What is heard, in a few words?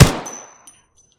shoot; Tacz1.1.5+; RSWS 1903